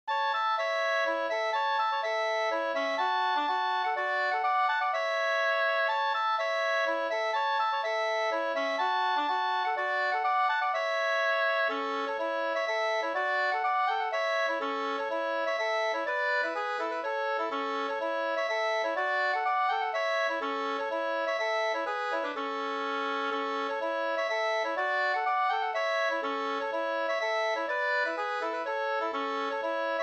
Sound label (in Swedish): polska; Vingaker; Johan August Malmqvist Floda